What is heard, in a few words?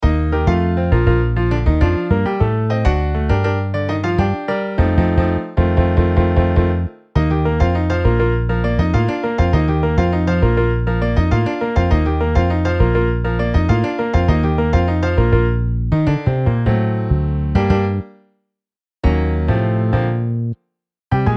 Piano Bass MIDI